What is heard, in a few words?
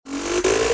aircraft shot start; Original TK Sounds